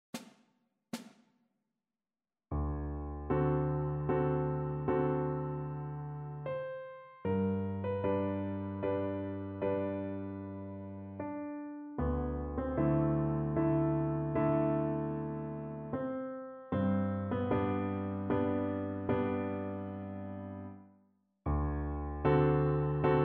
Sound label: vn pf; chopin; preludium A-dur